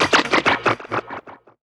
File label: loop; X-Static Goldmine 3